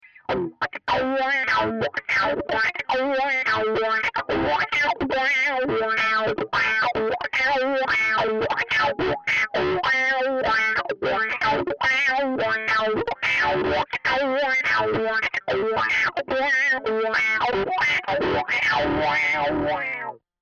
Wah Riff

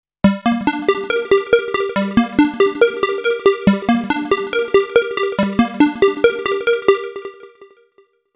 sqr fantasy

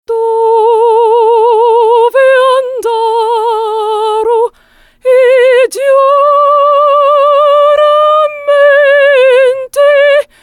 Opera Limited

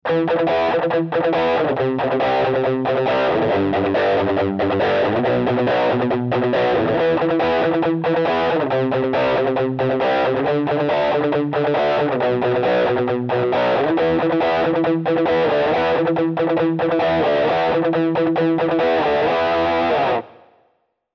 80s Rock

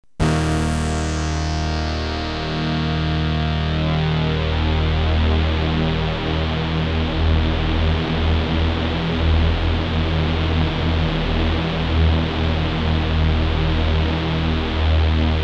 unison

